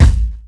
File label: rich mids kick single shot a key; kick drum samples